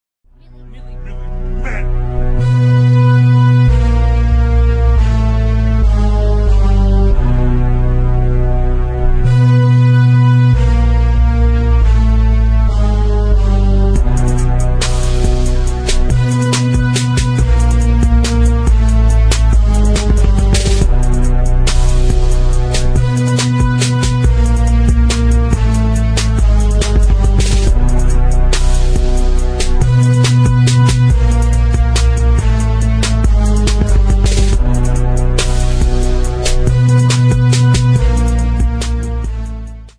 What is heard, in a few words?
smooth gangsta rap instrumental